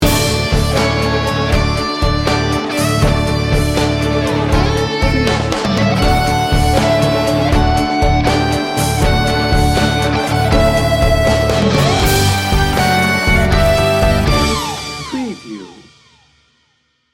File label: o come all ye faithful